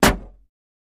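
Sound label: Metal Puncture Clank, small impacts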